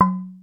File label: mallets